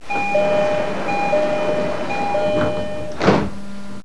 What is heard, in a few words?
Door JRE233 Close